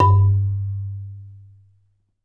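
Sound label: Marimba, soft bass, G1; Sampleheads - Dave Samuels Marimba & Vibes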